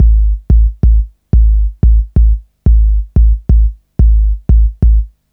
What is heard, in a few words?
Kick Loop, Drum & Bass